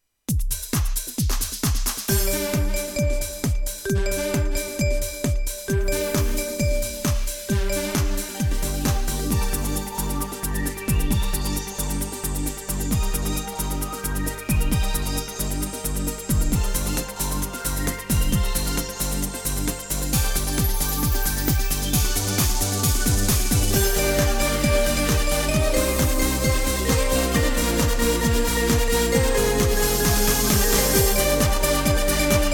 apachi, GW-8 tekno

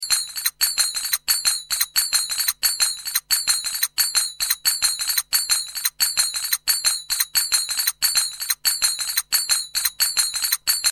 trakya zil; roland gw8 ritim

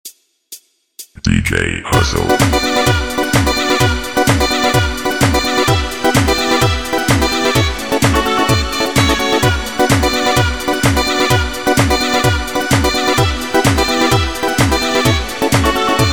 zurna beat

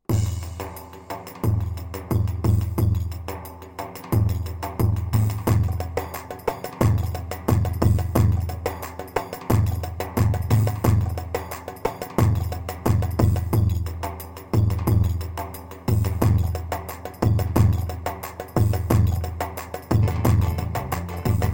pa 500 tr ciftlli 7, korg karisik ritimler

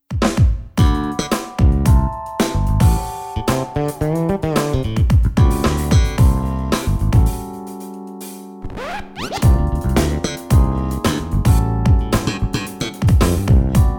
funk super kit, batı ses ve ritim; Ketron AUDYA; Trakya Müzik-Türk Piyanist Birliği

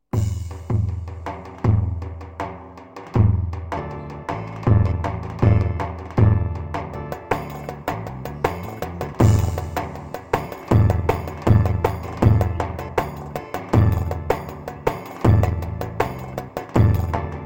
pa 500 tr harmandali, korg; tr karisik ritimler

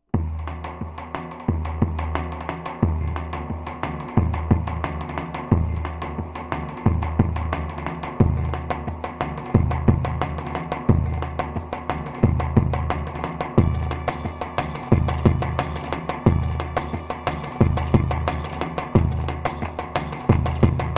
tr koy ciftetellisi, korg pa 500; tr karisik ritimler

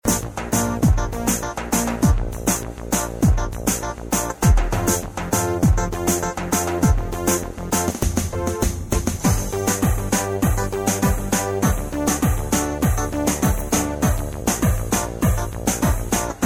gw8 croslu halay

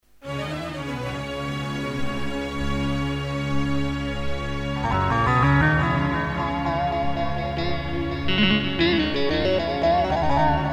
GW8 bağlama

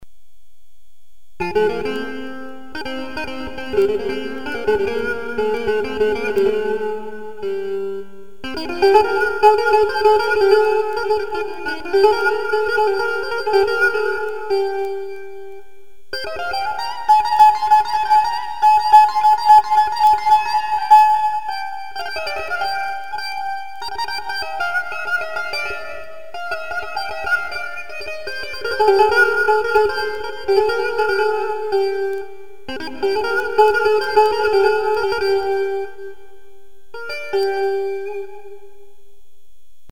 elektro mono

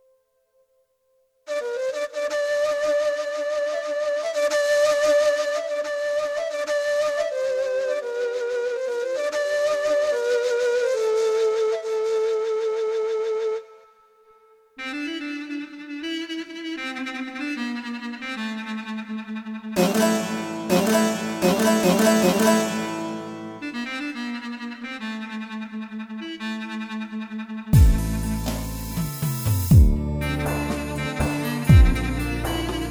gem wk8 le thm 4/4 ve tr sample sesler

